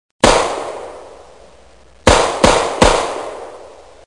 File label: small guns